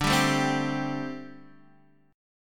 D 7th Suspended 4th, D, down, Guitar Open D

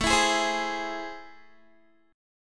A Minor Major 7th, down, Guitar; AmM7; strummed

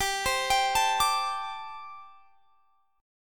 G Suspended 2nd Suspended 4th; Gsus2sus4; arpeggiated

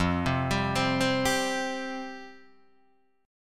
F Suspended 2nd F Guitar Open D

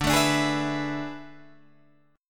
A Major 7th Suspended 2nd Suspended 4th

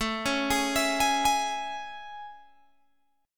A Major 7th A, Guitar, Open G

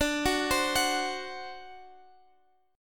C Suspended 2nd Flat 5th D

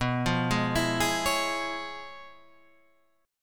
C sharp 7th Sharp 9th, B, guitar chord

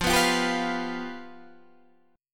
F Suspended 2nd Flat 5th, chord

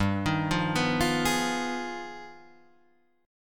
G Augmented 7th Flat 9th; Gaug7b9 Chord {3 6 3 4 6 4} arpeggiated

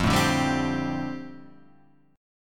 F 6th Add 9th F down, chord; F6add9 strummed

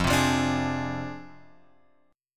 F Minor 6th, guitar chord; Fm6 Chord {1 3 3 X 3 4} strummed

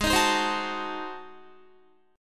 G sharp 7th Flat 9th G sharp down Guitar, chord; G#7b9; strummed

